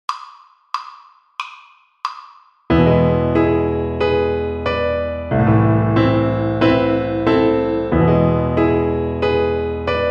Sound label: String Gym, Piano Accompaniments